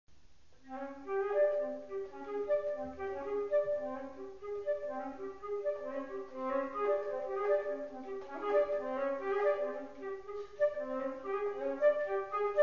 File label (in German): Sonate